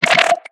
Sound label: croak, notifications; Nothing Phone (2)